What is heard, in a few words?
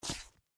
Female Boots Scrape, Footsteps, Female, Boots, Scrape, Hard, Wood, Surface; Footstep Sound Effects